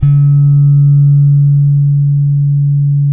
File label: Bass, LATIN, D#4; Spectrasonics; Bass Legends; ROUND LATIN